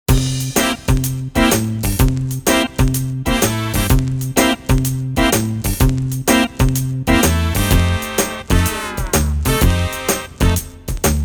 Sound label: Beat